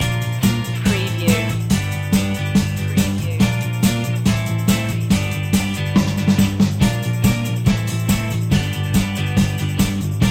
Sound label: rhythm kings loop